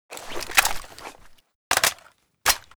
sbr reload; STALKER

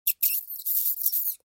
rat death; STALKER; customnpcs

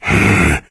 karlik make hit, stalker mutants; customnpcs

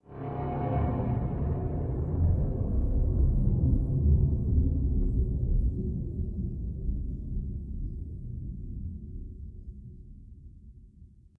ambient dark; STALKER

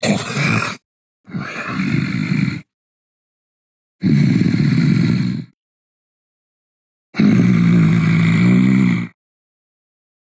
snork idle; mutants; STALKER; customnpcs

